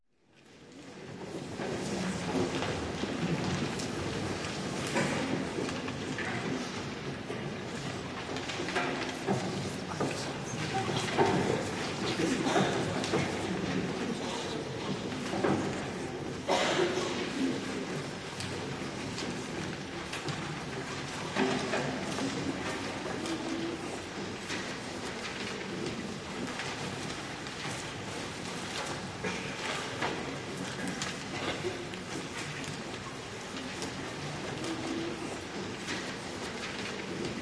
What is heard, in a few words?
Hood Ambience; Barnes The Stage Manager